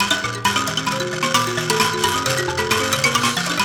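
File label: PLK Bass Mbira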